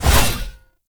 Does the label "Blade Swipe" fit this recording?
Yes